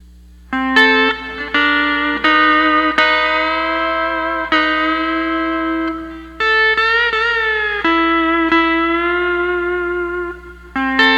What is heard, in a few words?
12 String Lap